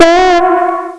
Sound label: horn; robocop